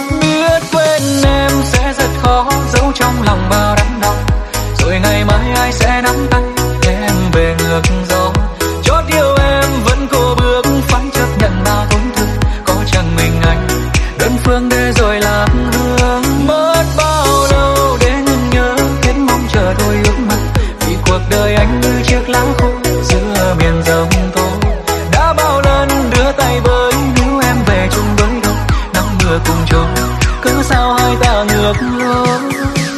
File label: Remix; nhac chuong